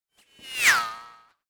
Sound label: Space Ship By - Space Motorcycle; Hollywood Edge; sound designer's toolkit v2